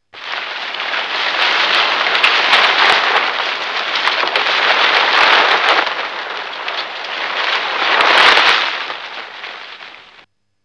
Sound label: rustle